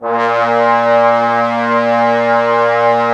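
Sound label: brass trombones A#, Woodwind Brass Ensemble; 90 s Sample CDs; Miroslav Vitous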